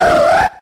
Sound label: Absol Cry